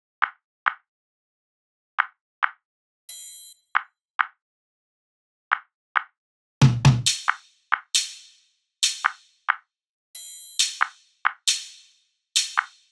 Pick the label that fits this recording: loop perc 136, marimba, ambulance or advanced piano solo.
loop perc 136